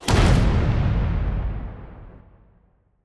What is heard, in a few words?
atom hit; OpenFrontIO